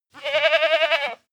goat baa stutter male

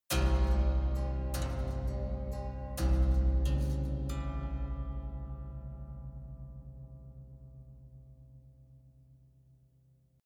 haunted strings eerie rattling sequence

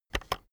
keyboard external enter press fast

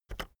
keyboard external enter press very fast soft

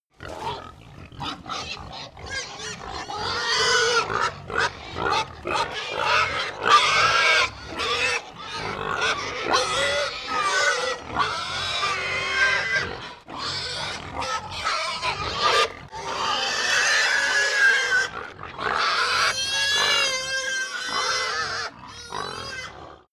pig herd grunt many

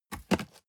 cardboard box close fold scrape short

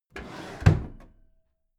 packed drawer smooth clatter close heavy

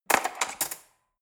cutlery fork drawer handle scrape

